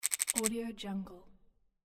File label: scratch lottery loop